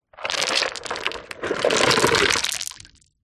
foley stones and pebbles tip out of plastic bucket onto concrete; zapsplat